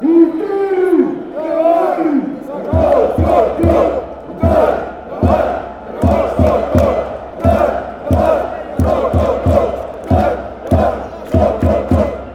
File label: slovenian chanting